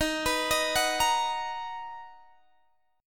B Major 7th Eb

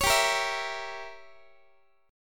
G Diminished 7th, Guitar; Gdim7 strummed; x,x,16,17,14,15

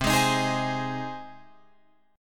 C Minor Sharp 5th, Guitar, Open F, Cm#5 chord; strummed; JGuitar

